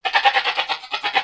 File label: Pets Goat Happy; creatures; Lego